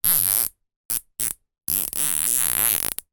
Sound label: industrial cable tie fasten and adjust